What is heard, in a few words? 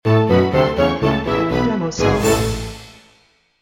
Funny Clarinet Madness, Major